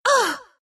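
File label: pain, Russian; Dota 2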